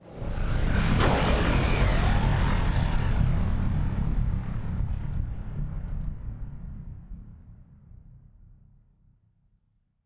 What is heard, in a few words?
ship transport ship move; Stellaris; machineage